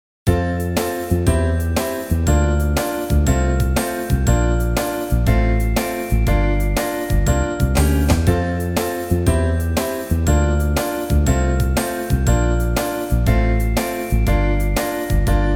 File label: game end